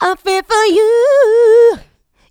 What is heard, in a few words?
mixed vocals; Houseworx